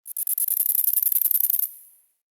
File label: Tiny Creature; UnderCity